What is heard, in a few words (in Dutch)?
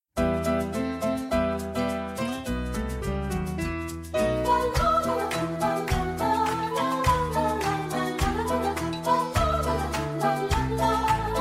a la media noche alt